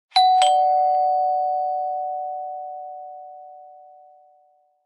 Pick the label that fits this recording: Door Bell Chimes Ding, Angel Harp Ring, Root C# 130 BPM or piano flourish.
Door Bell Chimes Ding